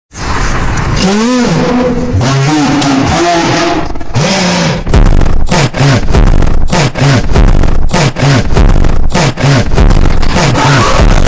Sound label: funk ultra slowed reverb